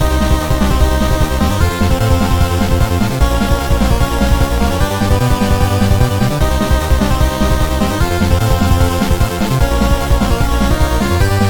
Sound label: Miniboss Loop